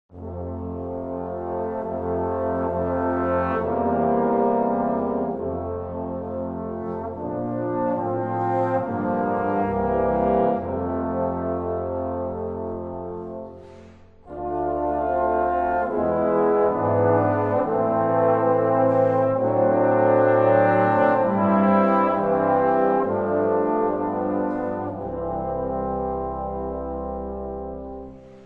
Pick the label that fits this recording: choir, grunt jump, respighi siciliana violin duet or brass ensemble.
brass ensemble